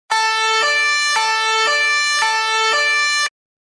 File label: police; son isole